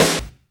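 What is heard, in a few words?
POWER KITS, snare; 90 sSampleCDs; Crypton - DrumBase5000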